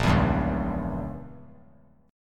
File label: Bb Diminished 7th Bb, Chord; strummed; JGuitar